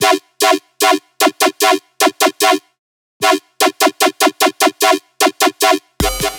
Bass Loop F 150BPM, Dubstep; Bass Loops; Synths; Cymatics; Dubstep Starter Pack

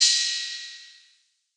Ride; Cymatics; Eternity Sample Pack; Drum One Shots; Cymbals

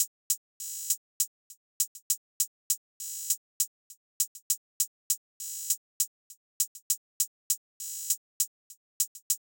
Drum Loop, 100 BPM Hihat; Cymatics; Vibes II Drum Loop Collection; Brilliant Drum Loop

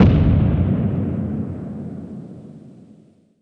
Cinematic Impact; FX; Aftermath; Cymatics; GHOST (Beta Pack)

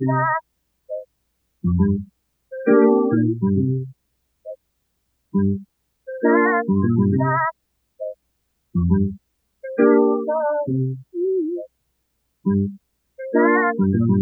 Melody Loop 135 BPM C Min Piano; Loop Stems; Cymatics; 2020 Melody Collection; Various Melody Loop 5

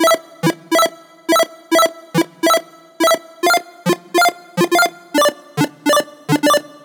Riddim Melodic Loop E 140; Ghosthack; Riddim Essentials Volume 2

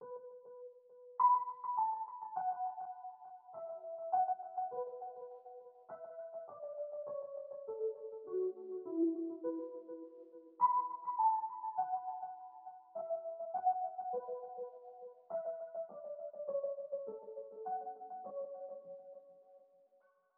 102 BPM B Min Lead; Loop Stems; Cymatics; Infinity Melody Collection; Frustrations

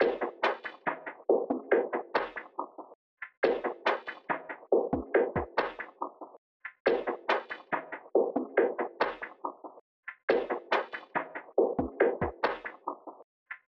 Drum Loop - 140 BPM Percussion; Loop Stems; Cymatics; DOPE DRUMS VOL. 1; Wanted

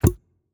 Material Liquid Bubble Pop UI; User Interface

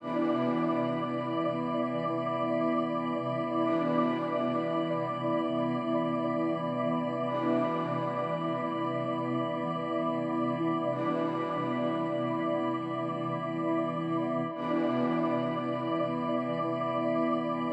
132 BPM E Min Pad; Melody Loops; Ambient; Endless; Cymatics; ANALOG EVOLUTION Beta; Ambient Collection

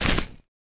Footstep Grass Heavy

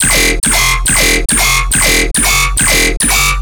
Bass Loop E 140BPM, Dubstep

